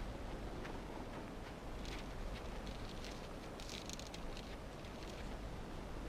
climb rope; Limbo; perception; gamesoundopedia

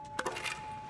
environment sound pickup wood plank

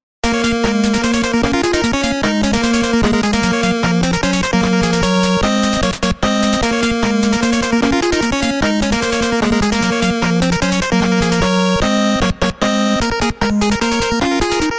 mmsoundtrack; Mega Man 2; gamesoundopedia

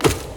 hit enemy; hollow knight

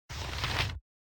tooltip sound; baldursgate

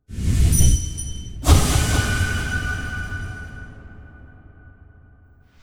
dreamnail clean; hollow knight

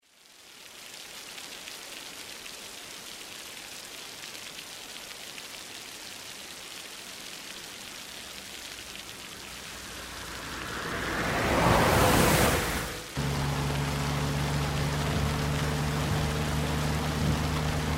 rain car hintergrund; Life is Strange 2; gamesoundopedia

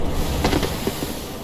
dodge heavy; Dark Souls III

